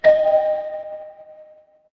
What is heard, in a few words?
door doorbell